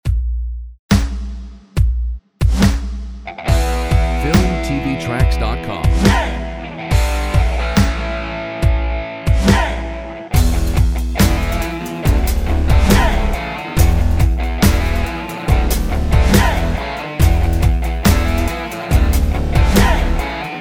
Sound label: Dynamic Powerful Rock